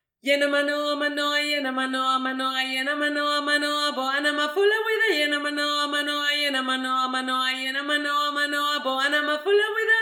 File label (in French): 3 VOIX; YENAMANOA